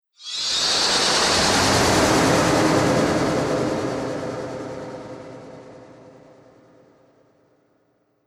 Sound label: Fantasy Spell, magic sound effect; Role Playing Game; Andrew Fleming